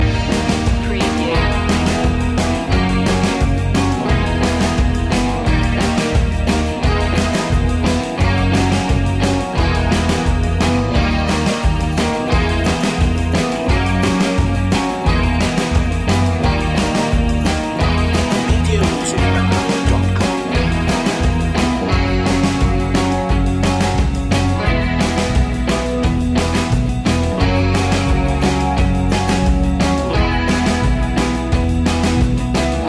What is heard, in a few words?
surfin loop